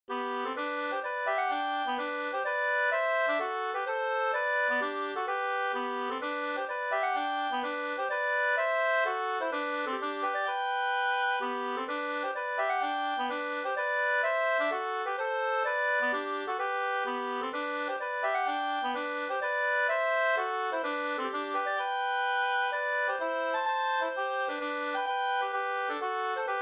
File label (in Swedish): polska